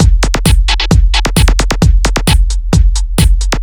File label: 132BPM Just Drums, techno; techno drum fx